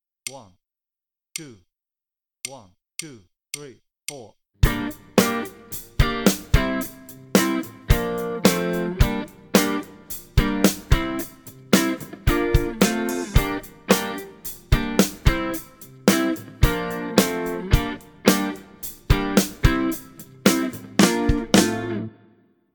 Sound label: Play-along, Bass; Bass Unlimited